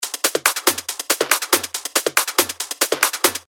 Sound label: 140 BPM, techno loops; Genie; Revealed Techno Top Loops Vol. 9